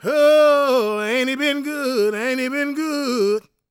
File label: gospel male, vocal; ILIO; Vocal Planet